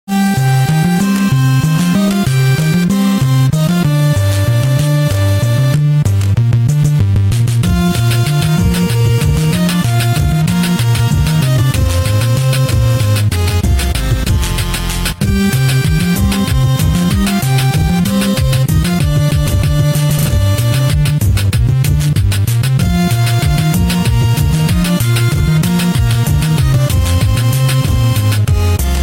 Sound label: 8-bit